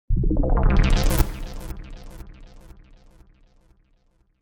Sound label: Synth Squelch, Bubbling electronic synth sound.; Zingers (Sound Effects:Multimedia)